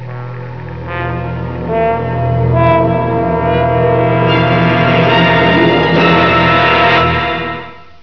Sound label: Suspense